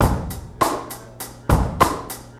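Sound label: room noise, drum loops boombap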